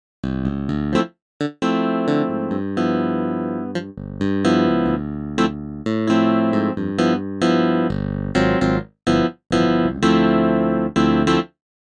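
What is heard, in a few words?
Slap Bass; SS Masterclass; Damper; Electric Clavier Damper Off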